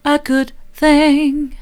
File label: a good thing soft, vocal